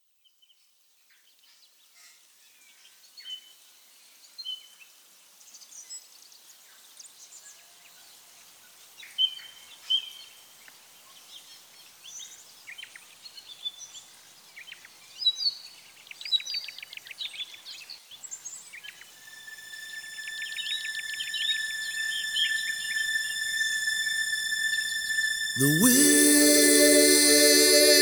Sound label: male keyboards bass; ambiance; music smorgasbord